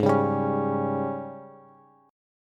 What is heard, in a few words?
Ab Major 7th Suspended 4th Ab down Bass; strummed; JGuitar